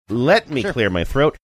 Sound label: let me clear my throat